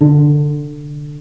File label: D string pizz, cello